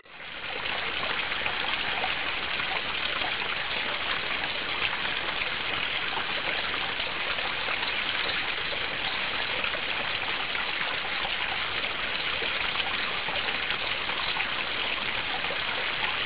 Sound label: stream; Sensorium; kawasaki